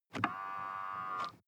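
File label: sports car interior side mirror adjust short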